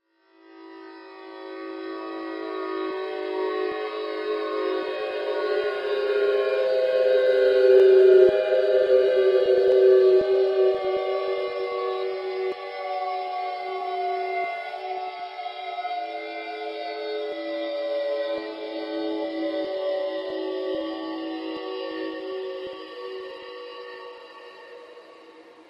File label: Bowed Cymbal Pitched